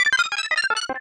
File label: PSI Rockin; mother2